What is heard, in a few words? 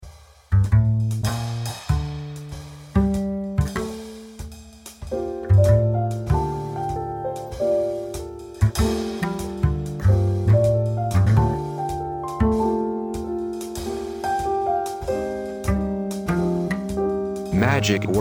jazz club early in the morning